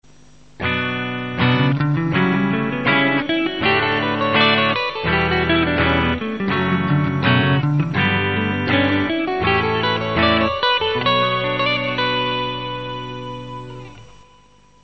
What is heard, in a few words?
b minor chord scale